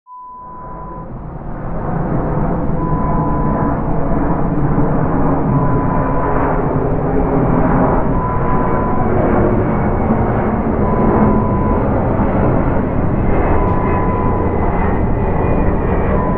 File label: Aeroplane Flyover